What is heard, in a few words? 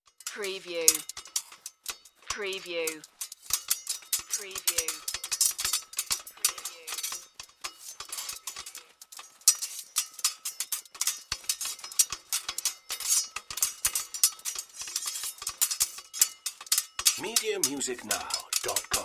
Warfare Medieval Battlefield Ambience Swords Only